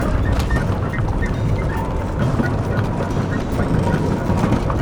minecart loop

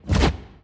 bighorn hand hide

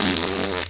fart

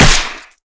whip hit

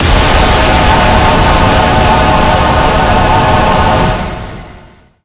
brimstone angelic blast

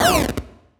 die; minigames; horizontal runner; isaac

